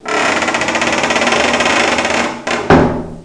dreh; Bordell der Begierde

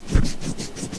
Kratz

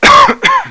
Husten; wcanasta

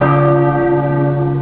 GLOCKE; ASSAULT; PC Gamer 46

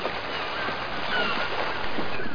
meer

